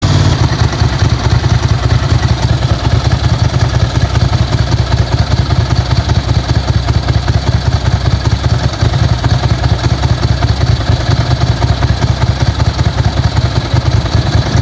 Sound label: ss900 termignoni